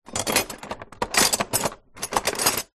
household large metal cutlery movement in drawer; zapsplat

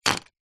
office pens pencils handful tap tips on table

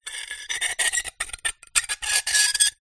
glass shards x2 scrape together; foley

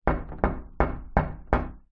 household window glass knock loose rattle

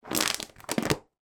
household telephone directory pages flick through

